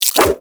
Nunchuck Start Whoosh; Weapons; Warframe; Infested

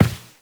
Step Default, Footsteps, Walk; Warframe; Tenno

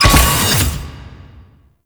Mall Sliding Door Open; Nineteen Ninety Nine; Doors

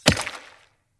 Throwing Knives Impact Rock; Weapon Impacts; Environment

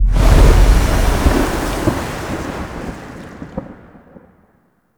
Diorama Whoosh In; Warframe; Tau; Tennocon Relay

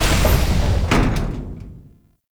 Portal Entrance Door Close; Duviri Murmur; Octopede

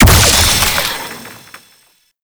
Void Heavy Scythe Crystal Embed; Warframe; Duviri; Incarnon Adapters; Melee

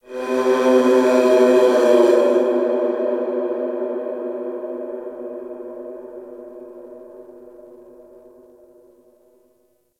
Creature Large; Warframe; Ambience; Orokin Moon

